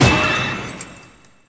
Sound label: box spike; Wacky Races - Sling Races; NextlevelGames